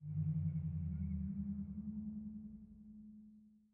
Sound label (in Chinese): 水下鲸鸣低声; ambient